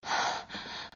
Breath; Lewd SFX

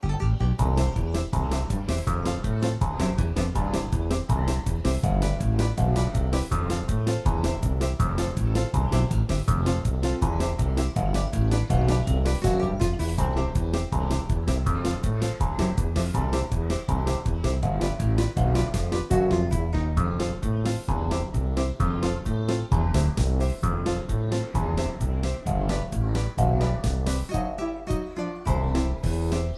(Instrumental); My Little Pony OST Repository; Season 1; Bonus Music

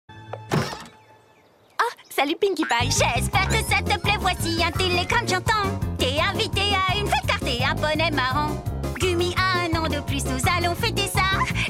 Singing Telegram (French); Season 1

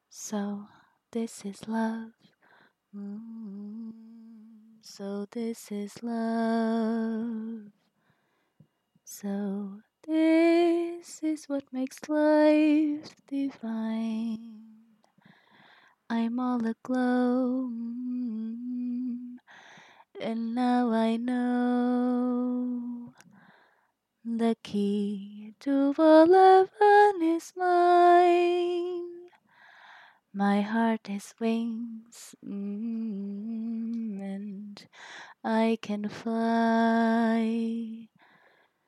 song, short, soft; disney; cinderella

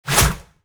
attack launch; Li Li Shadowpaw Sound Effects; Li Li Heroes of the Storm Responses; warcraft

